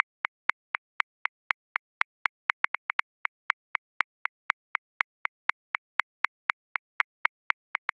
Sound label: Straight Hip Hop; Grooves; Drum Booth; Ableton